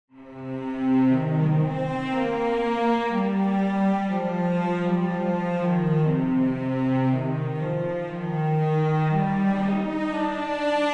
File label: cello; rough